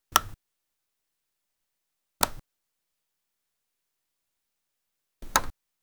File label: hover boto rpg